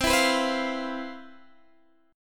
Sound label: C Major 11th C; CM11 strummed; JGuitar